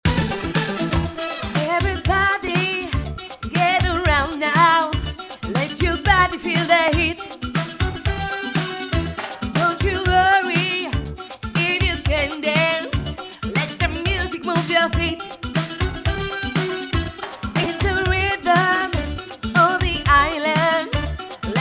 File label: conga